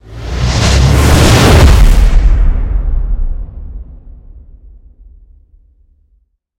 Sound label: win text change; godsofgold